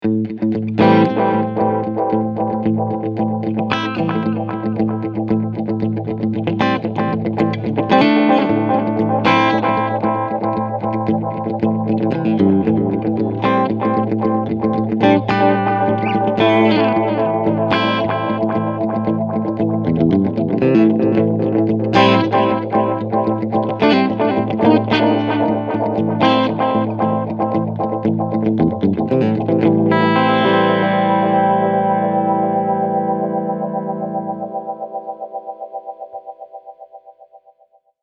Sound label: Tape Delay